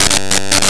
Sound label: Sci-Fi Alert